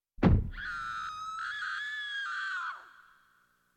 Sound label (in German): fallender Körper, Aufschlag, Schrei; Horrorgeraeusche